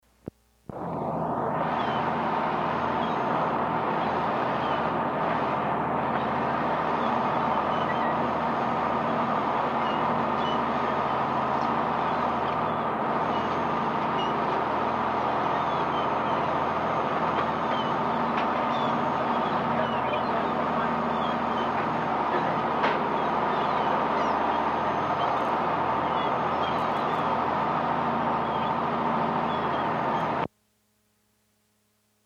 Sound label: birds